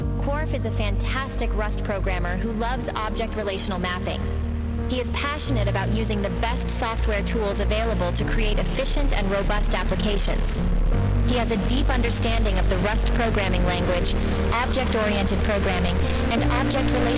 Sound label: synthesized audio